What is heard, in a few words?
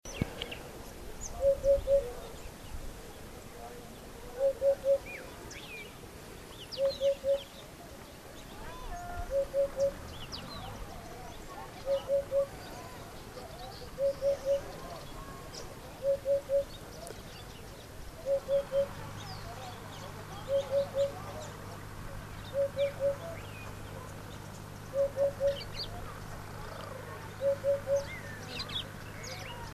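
udod golos, hoopoe voice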